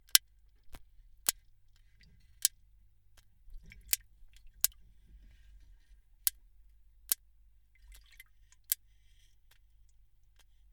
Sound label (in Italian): Rumore squalo; registrato per la prima volta in assoluto; ecco cosa lo provoca